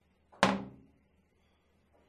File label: bathroom toilet lit closing quiet; la paz; your free sounds